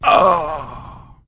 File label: death, player